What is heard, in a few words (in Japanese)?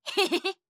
ヘッヘヘ！